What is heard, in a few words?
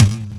tom, drums; virtual drum sounds; custom drums